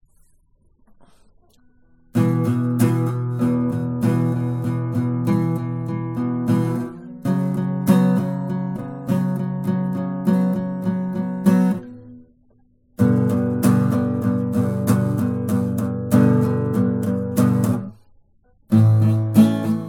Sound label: Christmas acoustic